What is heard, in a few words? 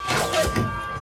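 lock, strange effects; Total Control - Action Cutz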